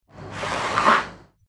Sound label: sliding, sliding tires; sliding car sound; teststrecke game